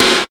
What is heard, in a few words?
Trebly Acoustic Snare Sample F# Key, snare single hit tuned to the F# note